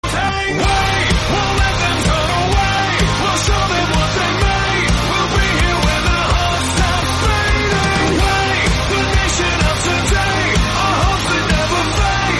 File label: english song